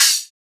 Open Hat; FLPRO

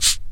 Perc, Percussionz

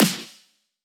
Drop Clap, Claps; FLPRO

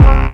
Kick

